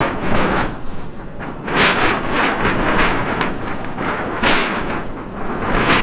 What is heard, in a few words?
large plastic sheet flapping in strong wind